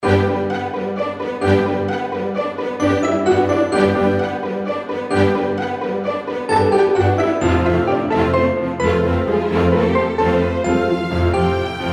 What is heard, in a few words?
Funny Advertisement Variation With Bells